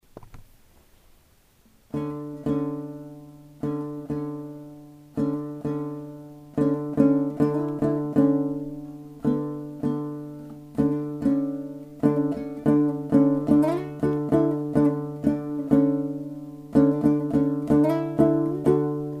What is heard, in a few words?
dombra impro